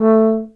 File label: inserted, Horns; Notes